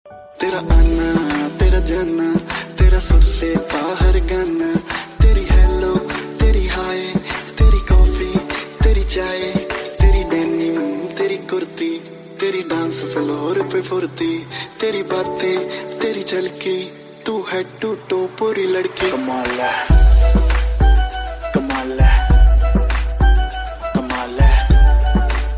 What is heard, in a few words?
punjabi song ringtone